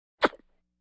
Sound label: Vocal effects breathing Moaning Baby